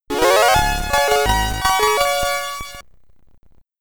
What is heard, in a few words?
Try (SID Stereo); International Rugby Simulator; Commodore 64